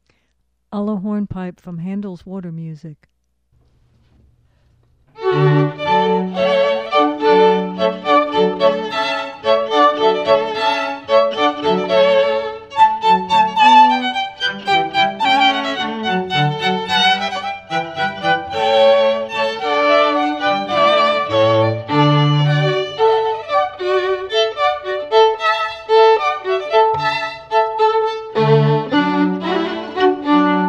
Alla Hornpipe from the Water music, classical string quartet